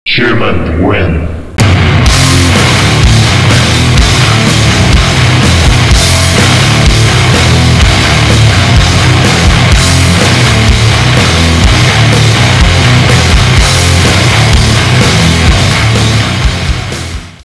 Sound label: human win; cstrike